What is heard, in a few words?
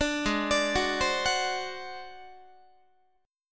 Ab 7th Flat 5th Guitar, chord; arpeggiated